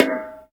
BONGO, ETHNIC PERC; Roland LA

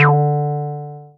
Synths BASS

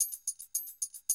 Classic DrumLoops, 110

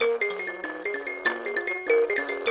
Buzz Kalimba; Roland LCDP11 Africa VOL-1

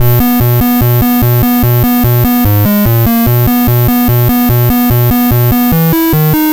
Apple II Bass, Chiptune

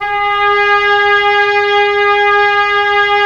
Oboe; Roland - LA Composer vol. 4

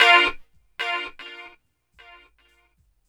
GTR

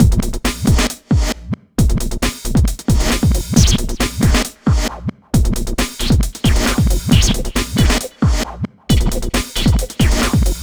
Old Schoolin, 135 Bmin, Hardcore Breakbeat; Big Fish Audio - Hardcore Breakbeat Action

